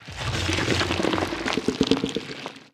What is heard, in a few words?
idle subtle, barnacle; Half Life Alyx